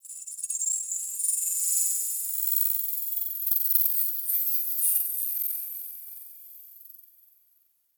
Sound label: Tech Texture Small, Ambience; Warframe; Cephalon Weave